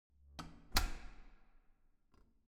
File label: object door corridor soft close